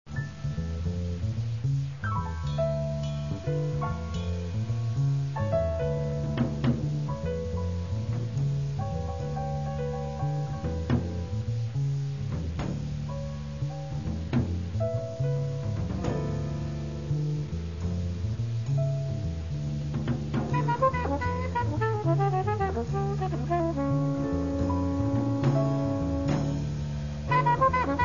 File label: jazz; ukr jazz